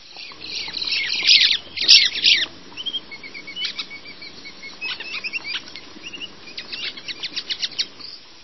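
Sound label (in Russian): птичьи писклявые разборки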